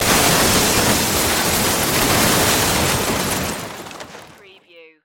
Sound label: Crash Roll Large